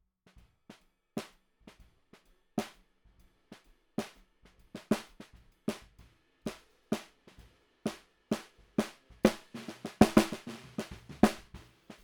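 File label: Snare Bottom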